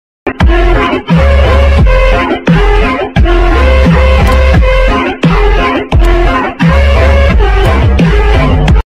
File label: music